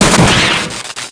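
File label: droid turret dead; Star Wars Knights Of The Old Republic I; XBOX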